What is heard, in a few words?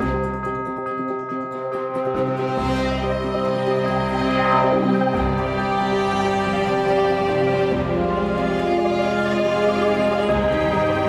Player Losing